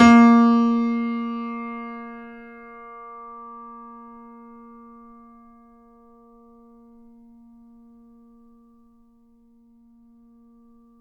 FF A#2, Grand Piano; AKAI S6000 CD-ROM - Volume 2; Grand Piano 256M

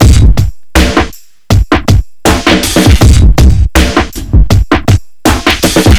Street Tip, R&B Drums

